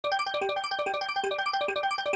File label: Base Tally Loop; holly Jolly Penguins; Mahi Gaming